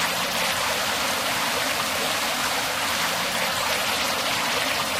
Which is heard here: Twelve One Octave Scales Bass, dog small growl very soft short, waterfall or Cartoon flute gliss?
waterfall